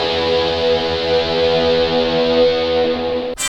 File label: GTR CHUCK, E.Guitar FX